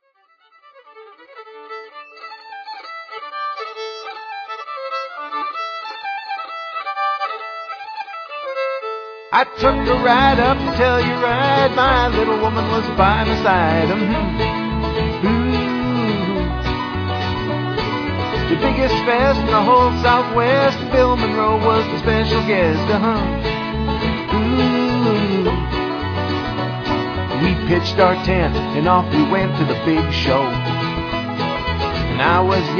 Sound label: Fiddle and Banjo